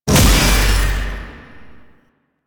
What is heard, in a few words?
Incarnon Void Beam Start; Weapons; Warframe; Duviri; Incarnon Adapters